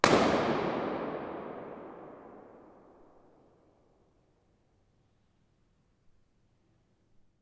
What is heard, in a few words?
IR Sweep; Zoom